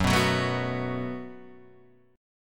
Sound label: F sharp Minor Double Flat 5th, Guitar, DADGAD; chordshape; down